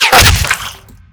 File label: bullet impact flesh; Benny Placeholders; violence